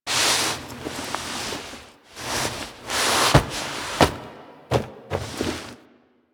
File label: Stand Up Foley; Call Of The Tempestarii; Wraith Quest; Reaper On Bridge